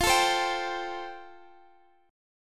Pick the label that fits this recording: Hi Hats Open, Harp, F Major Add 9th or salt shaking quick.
F Major Add 9th